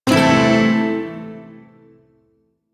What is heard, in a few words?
Pent Maj Chord A; Shawzin; Warframe; Duviri Shawzin